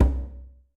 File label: button Stop; wild turkey